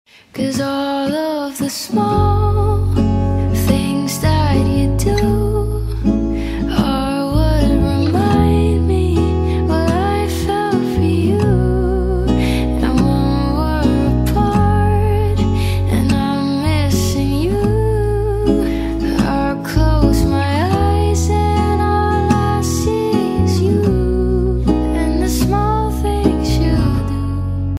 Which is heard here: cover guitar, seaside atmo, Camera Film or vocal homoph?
cover guitar